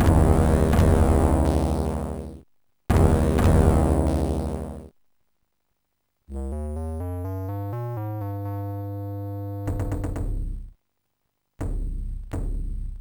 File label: game lost; Sonix Music Driver